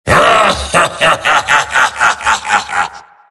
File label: laugh long; tf; skeleton king